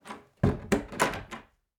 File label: Door close